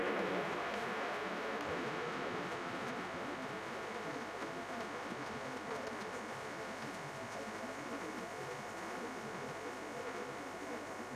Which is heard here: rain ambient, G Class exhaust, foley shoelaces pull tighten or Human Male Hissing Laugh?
rain ambient